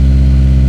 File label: CIVIC rpm, Engine; Cars; XBOX